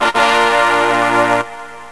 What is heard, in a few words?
Ta Da; Math Stars